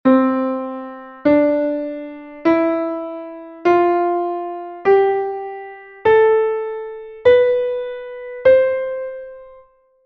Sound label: Japanese Pentatonic scale C dur scale sample